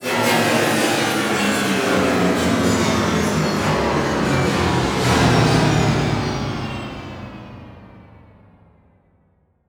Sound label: Title Transition Descend; Adobe; Production Element